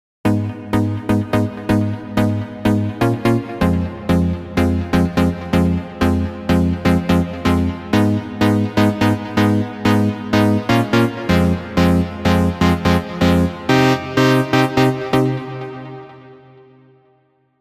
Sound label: Re Vibe, keys; revealed diva keys vol 2